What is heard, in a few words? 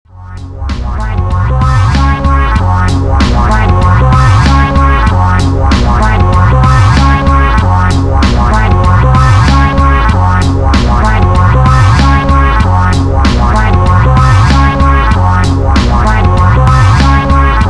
Interlude Synthetic